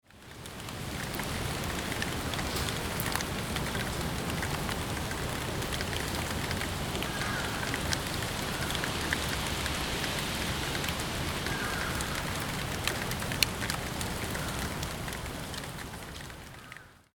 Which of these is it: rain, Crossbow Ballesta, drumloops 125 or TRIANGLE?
rain